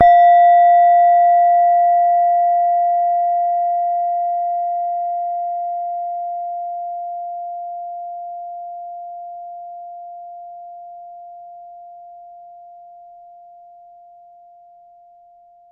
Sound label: Soft Strike, Small Bowl, Tibetan Singing Bowls; Percussion Samples